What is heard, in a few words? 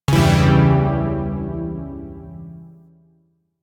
Phrygian Chord E, Zariman Shawzin; Music; Warframe